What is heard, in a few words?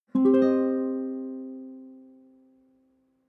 nylon guitar chord high minor soft